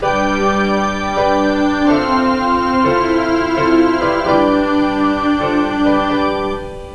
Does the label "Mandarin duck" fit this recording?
No